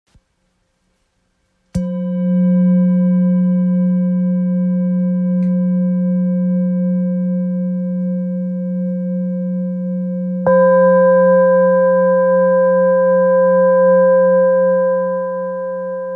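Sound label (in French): Bol therapeute do, bol chantant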